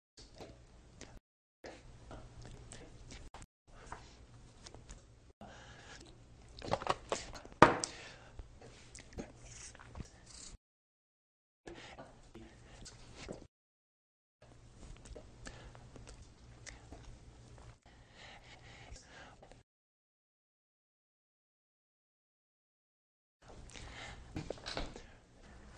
gaming

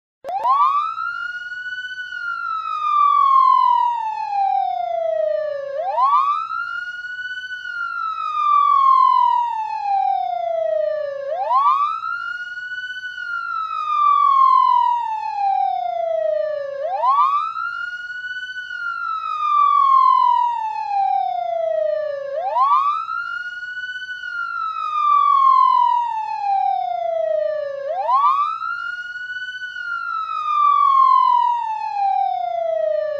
police siren; cave

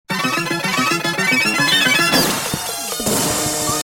stage clear

